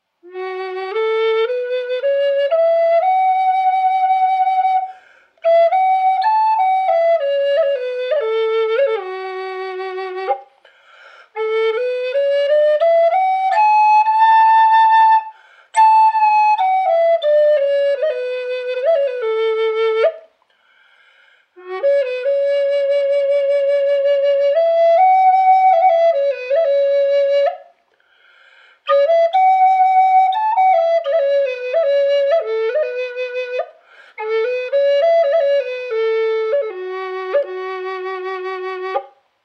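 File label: F-sharp minor tamarind dry, spalted tamarind flutes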